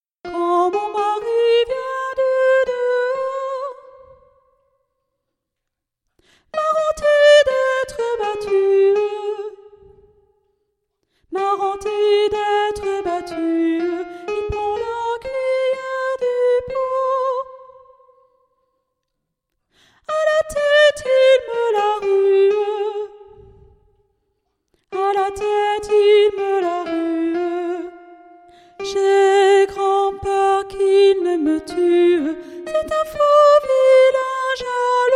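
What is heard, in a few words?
soprano; Quand mon mary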